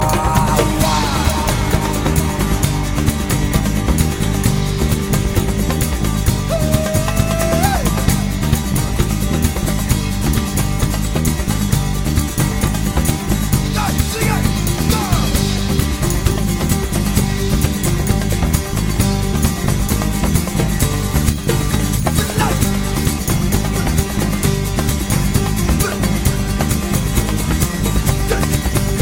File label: Fast Tribal